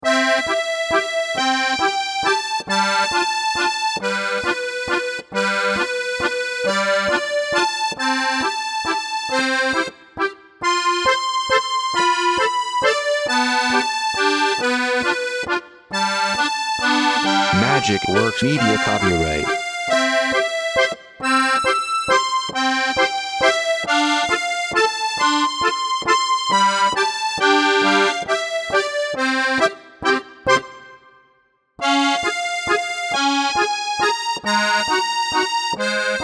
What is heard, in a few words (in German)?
Volksmusik